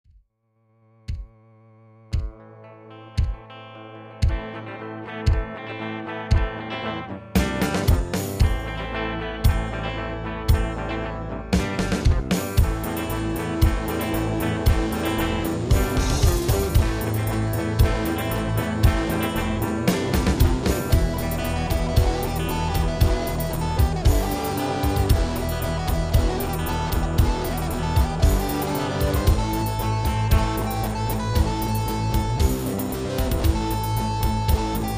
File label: Instrumental